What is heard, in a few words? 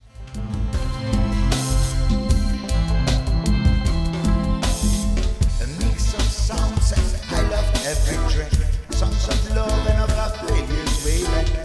Reggae